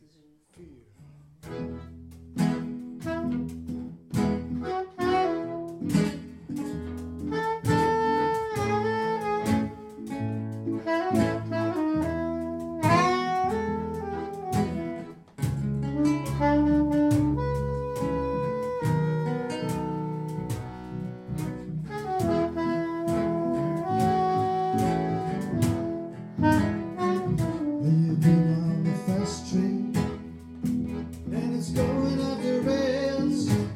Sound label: fast train